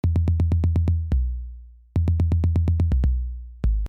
125 booty bass; Loop Mania Sampler Pack

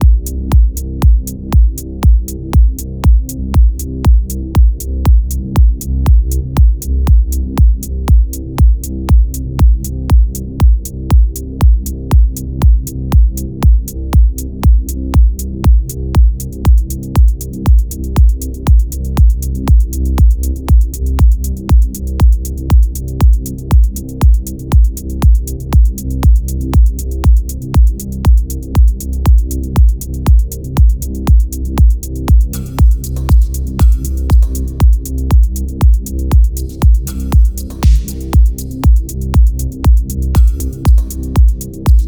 Dark Minimal Pumping; CUES for film TV games etc

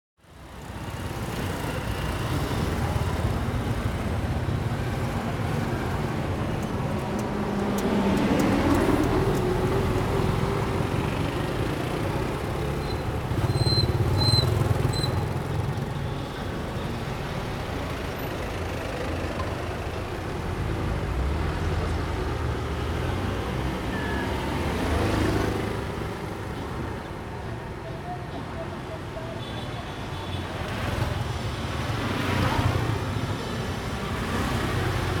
Traffic Preah Sisowath Quay, Cambodia; Les Cartes Postales Sonores; NOISE ON EARTH Vol1

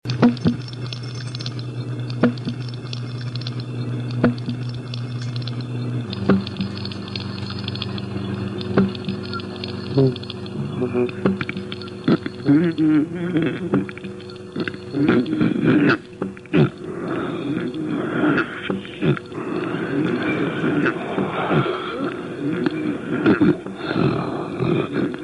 Movement 3a Vocal

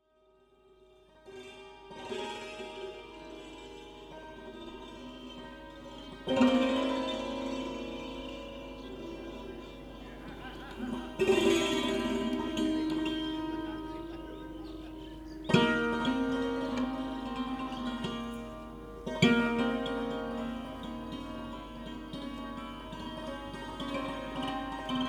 Santoor and Tabla at Assi Ghat Varanasi; Religious Music; Samuel Corwin; Selected Field Recordings from India and Nepal

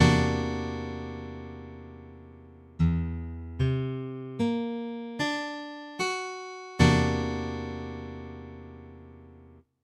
C9b5, Guitar Chords

